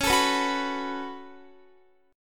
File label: Db 7th Suspended 4th Sharp 5th Db down Guitar; strummed